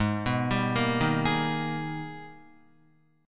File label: Ab Minor Major 9th, down, Guitar; AbmM9; arpeggiated